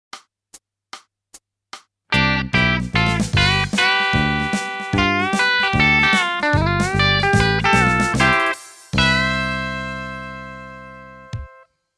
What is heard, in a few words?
country